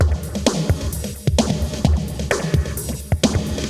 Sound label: Moog Phaser HR-16B (130BPM), cyborg beats